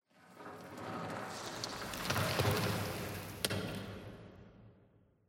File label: tenement concrete debris; Half Life Alyx; rocks